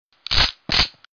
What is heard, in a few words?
Pumping Shotgun